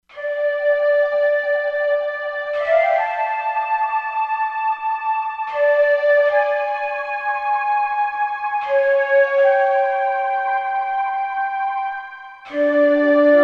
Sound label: AutumnFL, Korg MSC-16S; Environment